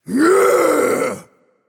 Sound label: battle cry; Smite; camazotz